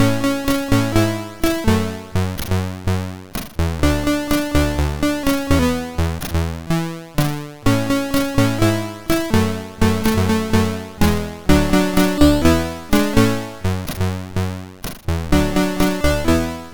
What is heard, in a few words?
Commercial tune, sid, MOS6581R4